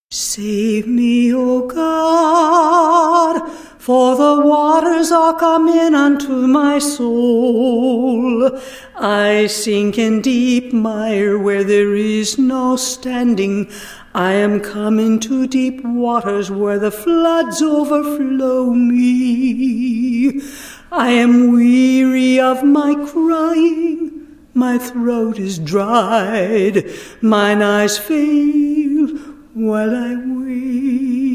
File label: a capella scripture song